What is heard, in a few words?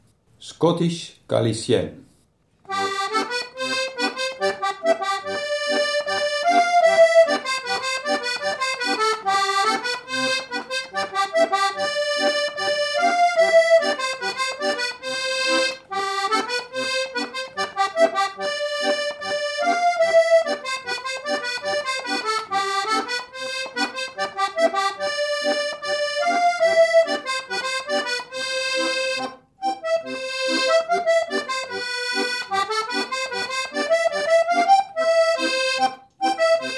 scottish galicienne